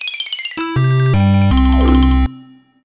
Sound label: Game Over; Nintendo Land